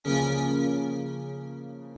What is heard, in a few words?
Item Found Generic